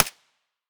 mp5 silencer mono distant

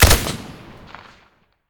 ak103 silencer stereo, weapons; STALKER; IWP

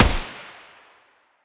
sa58 muzzle mono distant

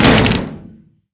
pistol drop metal thin; STALKER; IWP

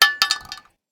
Shell 12ga metal; STALKER; IWP

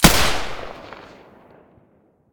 silencer g36 stereo, weapons

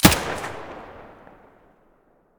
mp5 muzzle stereo, weapons

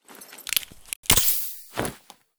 stimulator use; STALKER; IWP; item usage

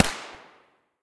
silencer p220 mono distant, weapons

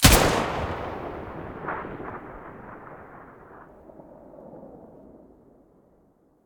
aug muzzle mono, weapons; STALKER; IWP

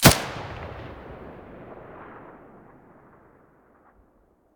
muzzle mono

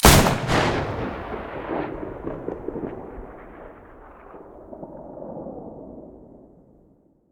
muzzle protecta stereo, weapons

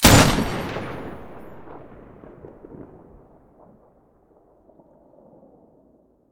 muzzle pkm mono, weapons

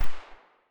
silencer p220 mono far, weapons

